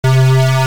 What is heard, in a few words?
pistol shoot; sfpistol; weapons